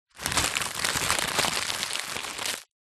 household telephone directory yellow pages page screw up